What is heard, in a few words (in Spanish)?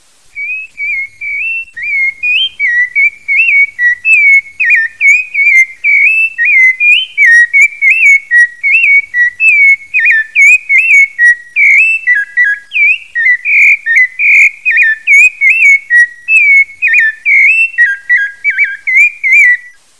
zorzal; cs valleywarehouse